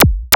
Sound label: LOW ZIP; Best Service Advanced Media Trax Building Blocks; WAR GAMES